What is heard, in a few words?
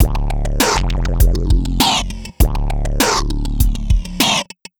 Noise Beat; Drums & Drones